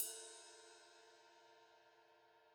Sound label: Ride, Multi Velocity Cymbal Samples; ZKC 20; apulSoft apTrigga2